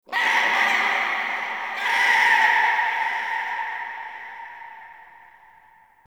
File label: Interior Texture Painful Screech, Infested, Ambience; Misc Indoor